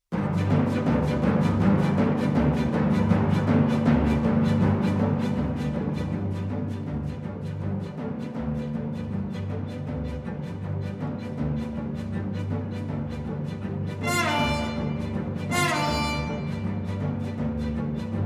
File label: piano